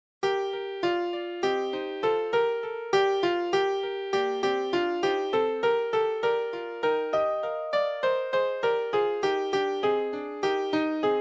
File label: piano mezzo